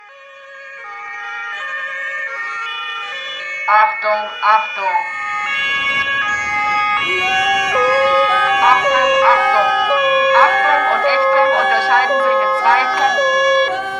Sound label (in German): Bullenjodler mit Intro